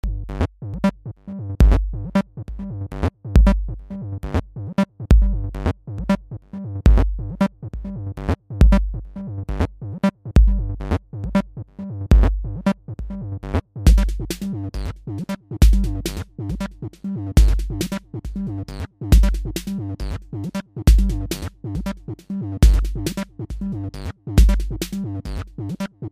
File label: techno